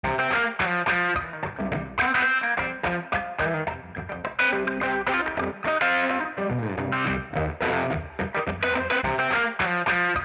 reverb loop Spring Reverb on Guitar; Eventide

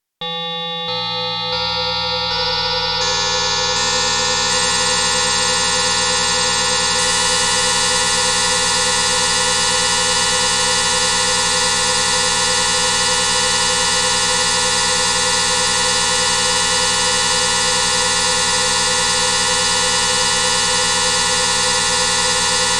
REPRO-5 Chime Time NO HQ

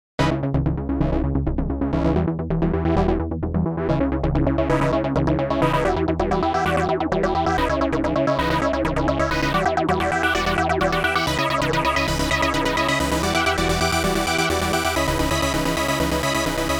LC Poly Arp

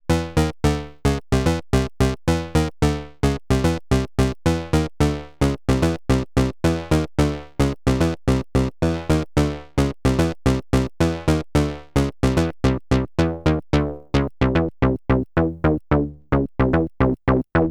2 OSCs Ringmod; Freds Lab Töörö; O2 O1

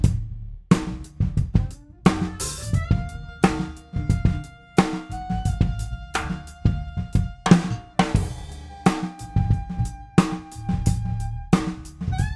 Drum Summe SSL E WAVES

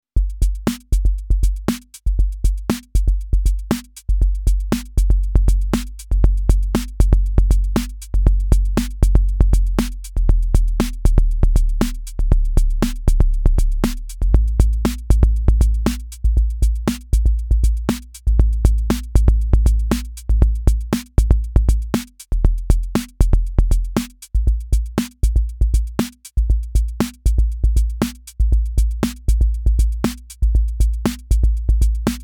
Akai Rhythm Wolf Kick

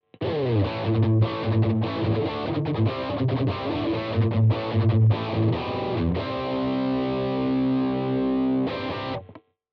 DUAL CUBE Metal Stack dry

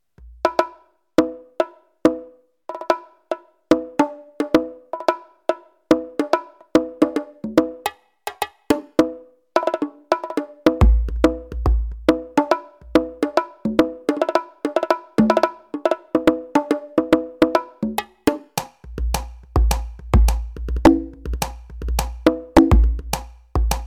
ROLAND SPD-20 PRO Conga Bongo

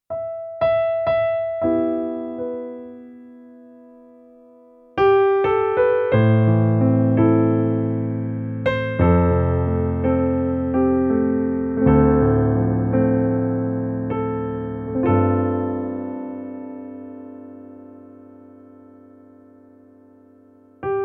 Yamaha DGX670 CFX Grand

